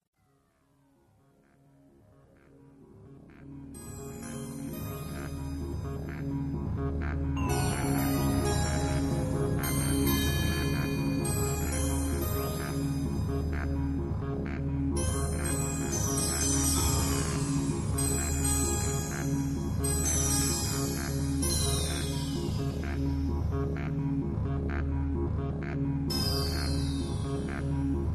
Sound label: Instrumental